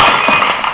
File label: GLASS